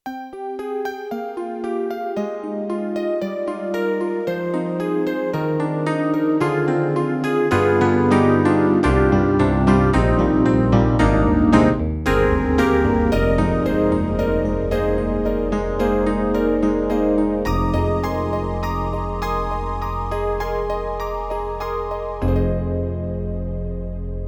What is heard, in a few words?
YAMAHA MOTIF-RACK ES Yama EP's